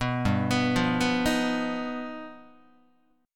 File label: G Augmented 7th, Guitar; G+7 arpeggiated; JGuitar